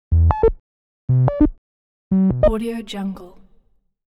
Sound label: call signs for a laptop or monitor